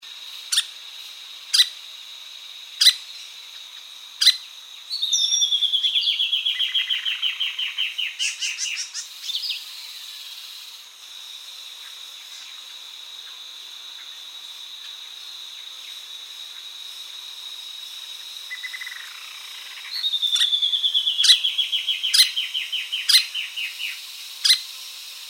red rumped woodpecker

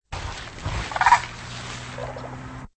demoiselle crane

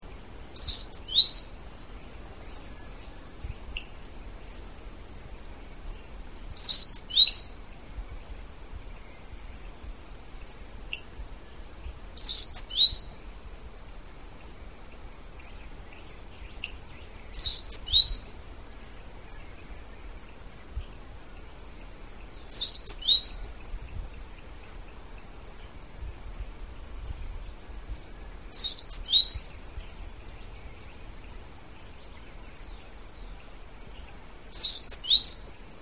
rufous naped brush finch

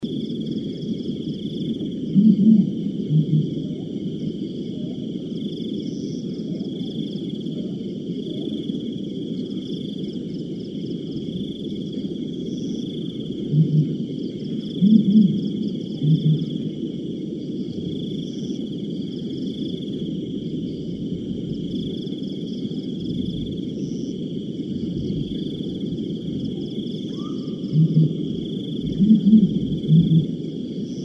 brown fish owl

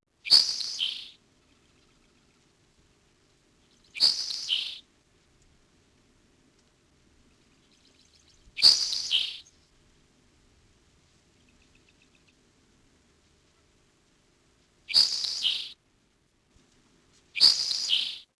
sharp tailed sparrow

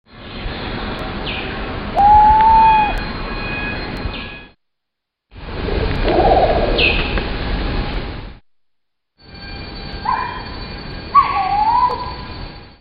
coral billed ground cuckoo

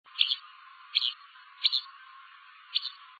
oriental sky lark

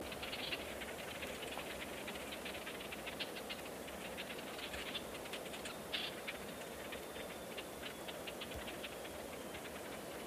timor sparrow

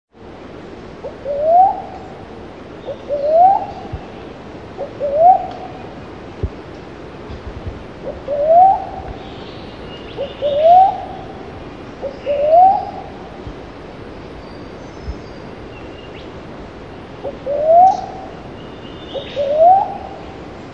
slender billed cuckoo dove

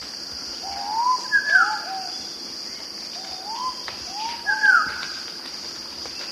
dusky cuckoo dove

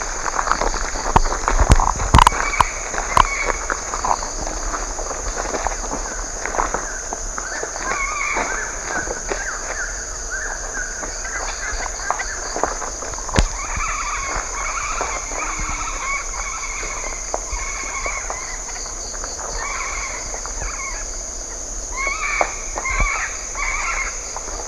great billed parrot

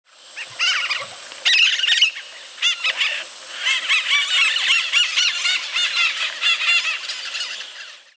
crimson fronted parakeet

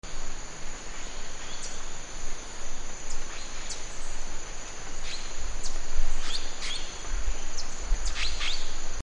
orange cheeked parrot

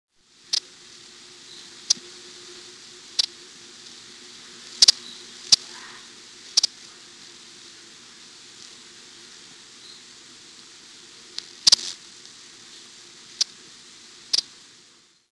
broad billed hummingbird

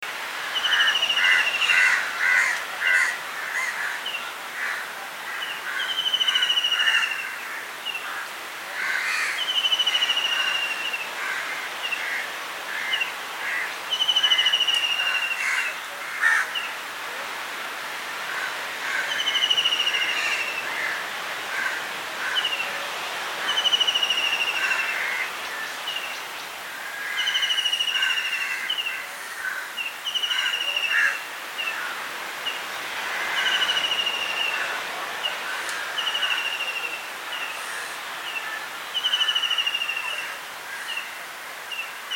white breasted kingfisher

